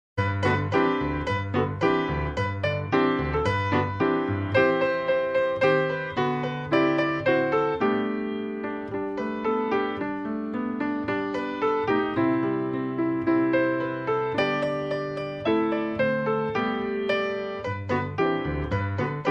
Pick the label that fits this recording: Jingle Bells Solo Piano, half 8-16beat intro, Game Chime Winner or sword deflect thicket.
Jingle Bells Solo Piano